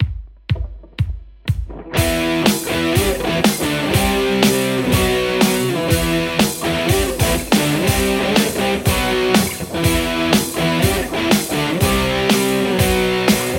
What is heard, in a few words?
lespaul 8505; nickcrow